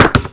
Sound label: physics car tire bounce